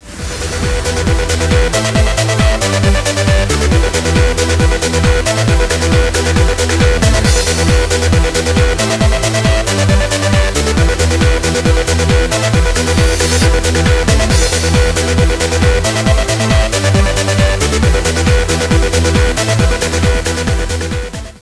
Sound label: techno